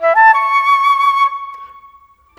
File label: Flute, Orchestral Series Rock and Pop; Sonic Foundry (Sony Creative Software); Orchestral Series VOL-4 - Rock and Pop